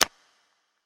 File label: gamble mouse click; games; Corrida Romance; Wazdan